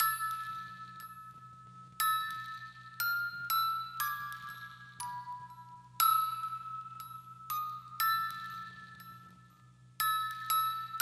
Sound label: musicbox